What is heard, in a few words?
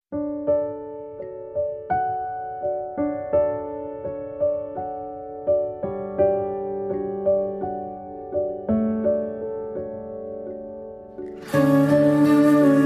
Instrumental Ringtone